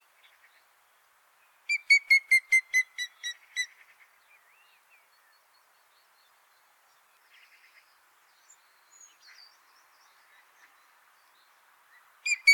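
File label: grey-headed woodpecker voice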